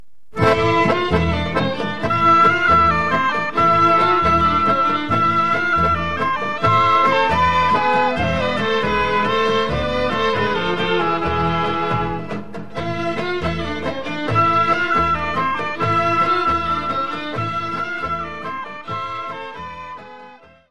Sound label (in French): Zenska Albanska Igra, Albanie